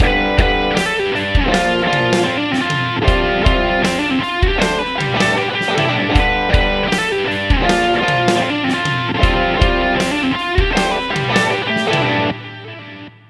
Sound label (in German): Swamp gedoppeltes Gefiedel